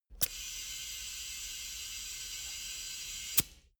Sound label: tire pressure control inflate